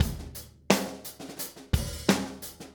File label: 087 Steady Grooves, Drums; Pop Rock; Moderate Tempo; Pro Tools Essential Loops; Digidesign